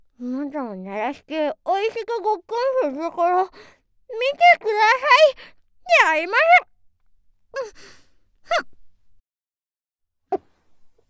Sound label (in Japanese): ザーメンゲップ2回！; ザーメンゲップ集